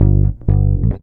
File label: REAL BASS, BASSLICKS; Ueberschall; Jam Box